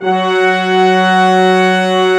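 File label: Brass Ensemble long; Roland L-CD702; Lrg.Ensemble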